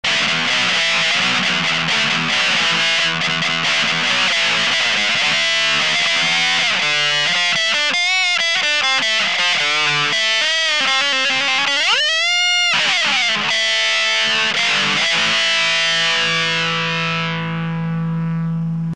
turbodrive

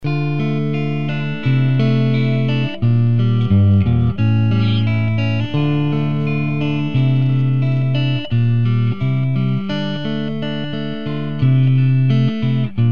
rocket clean; Сравнение динамиков от Celestion

